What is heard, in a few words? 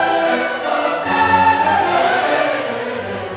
chorus & alto solo; INTERLUDIUM - Scena